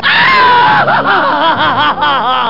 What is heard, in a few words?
cry